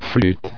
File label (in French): flute